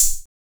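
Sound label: open hi-hat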